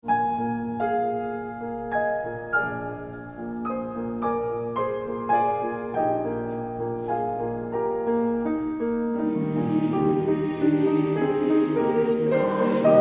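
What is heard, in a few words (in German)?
Fassung für Chor und Klavier zu vier Händen; Wie lieblich sind die Wohnungen...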